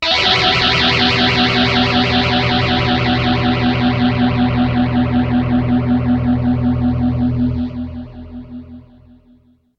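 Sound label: dirty guitar pt9pro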